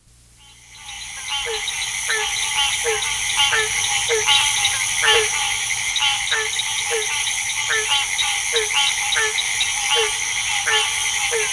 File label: group chorus summer july